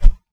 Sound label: hammer swing; melee; tfa starwars melee weapons pack